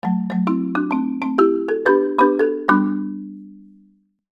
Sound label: marimba ringtone